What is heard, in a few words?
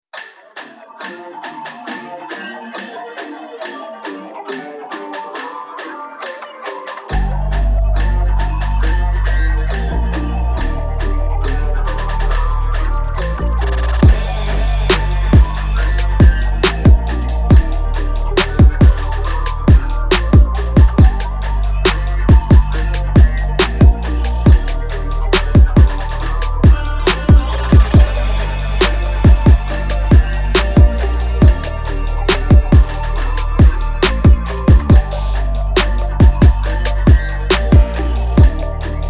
Instrumental